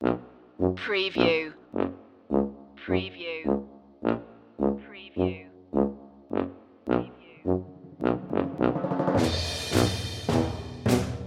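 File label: tuba and percussion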